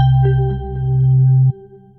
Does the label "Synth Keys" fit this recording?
Yes